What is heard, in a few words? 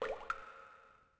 drop, CAVE; Pirates Of The Caribbean